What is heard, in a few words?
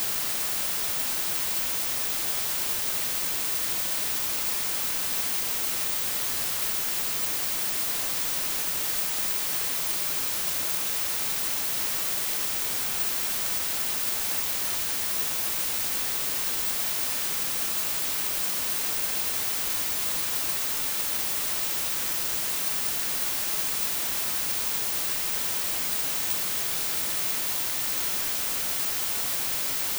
blue noise